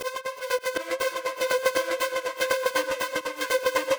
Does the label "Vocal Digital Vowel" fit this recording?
No